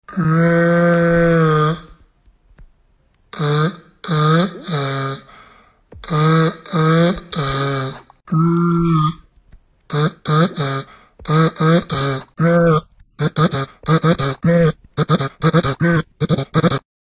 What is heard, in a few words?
uhhhhhhh uh uh uh